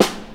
puffy funk acoustic snare sound A sharp key, Royality free acoustic snare sample tuned to the A# note